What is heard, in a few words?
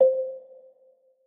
Move C 4 Mf; Mixolumia